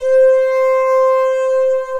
呼！C6; 音MAD; 蓝蓝路

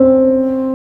在弹琴 Piano C5; 亚兰; 转生成为了只有乙女游戏破灭Flag的邪恶大小姐

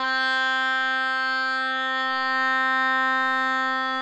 和声; 小林家的龙女仆

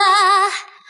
G5 啊啊

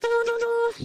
唢呐 A#5; 电棍

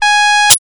笛子 G#5; 电气笛; raw; 音MAD; 东方电气笛

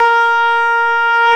哈哈哈哈, A#6; 穿山甲

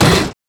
踢桌; 你的名字; mitsuha

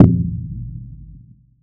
镜子 Kick

